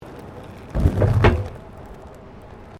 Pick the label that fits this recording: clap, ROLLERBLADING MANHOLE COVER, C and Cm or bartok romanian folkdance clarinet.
ROLLERBLADING MANHOLE COVER